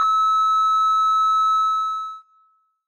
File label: Dead Pulse